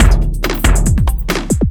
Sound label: Beat, Drums, Eclectic Electronica